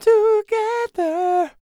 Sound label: SING 032, Vocal R&B & Dance; ILIO; Vocal Planet VOL-4; DD R+B